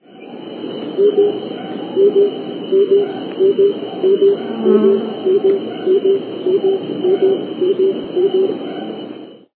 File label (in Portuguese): capitao de bigode carijo